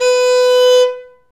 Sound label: nyckelharpa H 4; SoundBytes - Early Patches